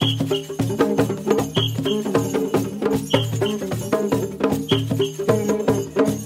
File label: 153 BPM; Global Influences Loops